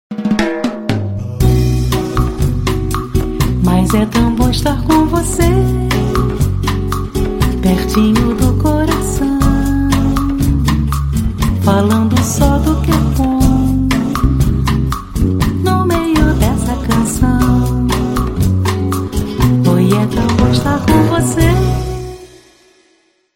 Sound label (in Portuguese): nokia ring tone antigo